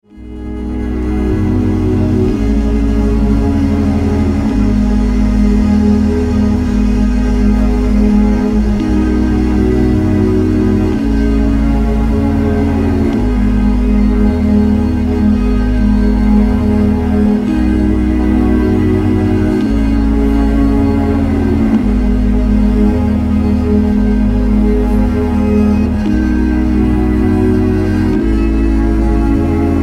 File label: shattered 30 strings